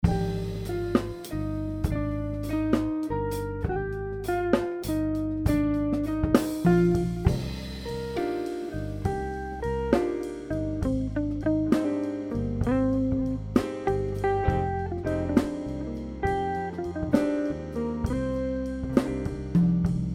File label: Sample Jazz Before